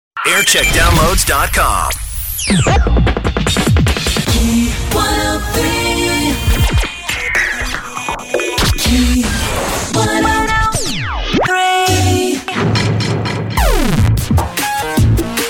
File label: Jingle Montage; Key 103 Manchester; Reelworld; 2003; 2000s